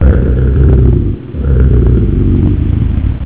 moan; spacehulk

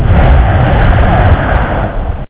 hiss; spacehulk

